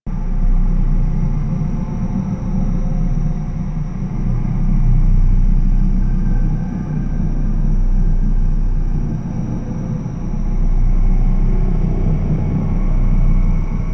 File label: Challenge Amb A, Ambience; Warframe; Tenno